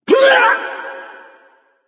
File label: grunt hurt; smite; thanatos